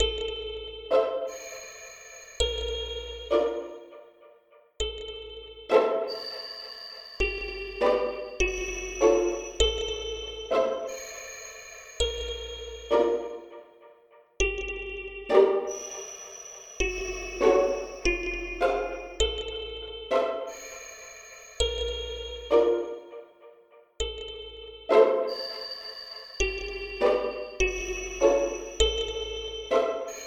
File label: Pizz synth; toki tori 2